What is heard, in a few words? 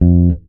Electric Bass High F Staccato; ProTracker 2 Clone